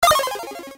Videogame leave application grid; Nokia N79; Audio themes

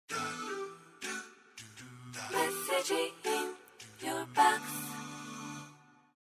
Message tone

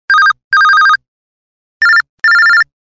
Beep

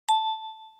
Fold close; Nokia; 7390

